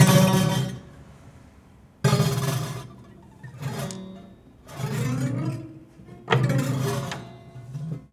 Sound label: Marble Bounce Roll, Piano Destruction